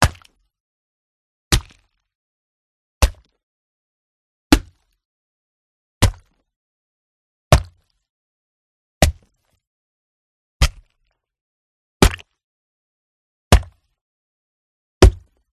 impact juicy watermelon; audiotank

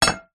household plate ceramic down clink

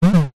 science fiction console error tone

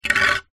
boat wooden oar old drag movement on hard ground

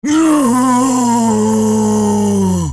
Zombie Moan; soundbits

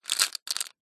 leisure poker chips handling short; zapsplat

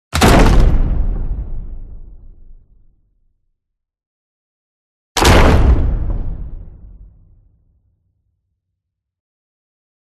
CINEMATIC IMPACT Hit Wood; smartsound

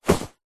foley clothing shorts thick cotton grab

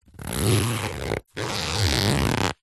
foley luggage bag small fabric zipper slow

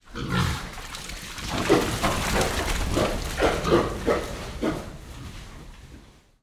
animals pigs in shed wet mud shed run scared

